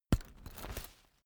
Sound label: umbrella telescopic close